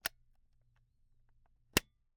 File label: Camera, Kinusa K77, 50mm lens, plastic, film compartment, open close, creak, pop; Eiravaein Works; Vaeyan IV